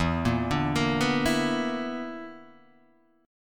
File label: B Minor Major 7th Double Flat 5th, chord